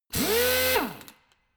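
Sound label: impactor soft long, drill; maschinen; bohrer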